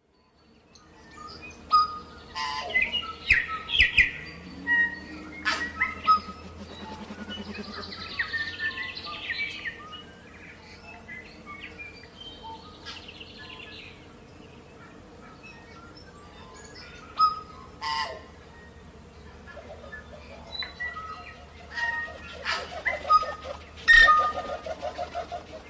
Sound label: ambient menu